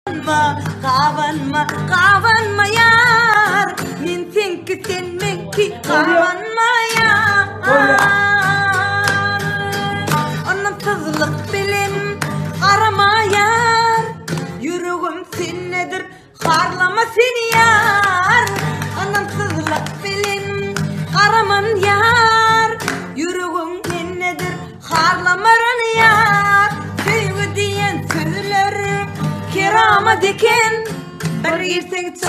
turkmen gyz gitara

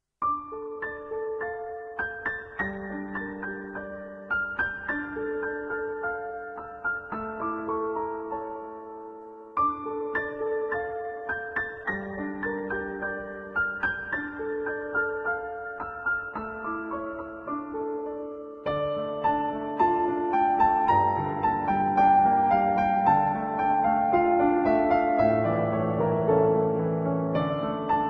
piano music; choliqushi

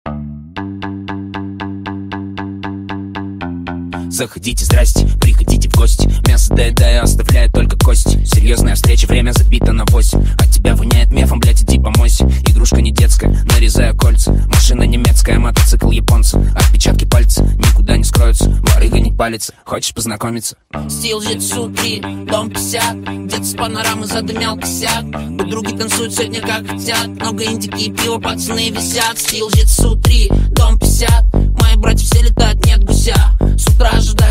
bass remix; kazakh

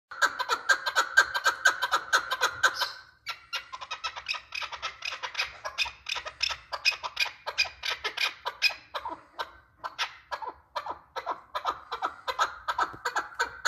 chukar partridge male call; krasiviy golos kuropatki; keklik sesi ovozi

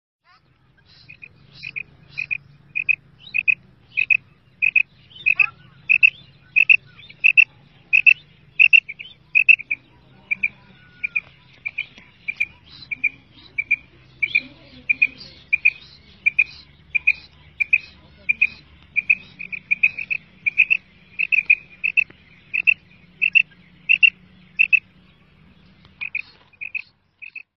female quail sound; new female batair sound

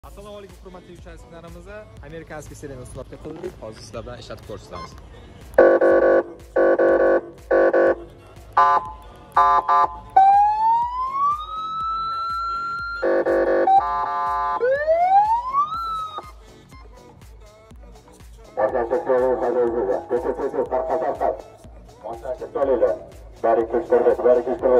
sirena amerika spec signal migalka sirena; lyuboy turdagi; straboskop

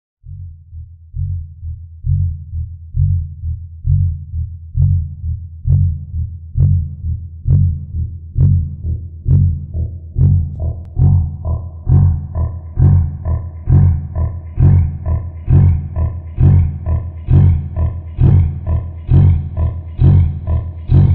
slowed reverb

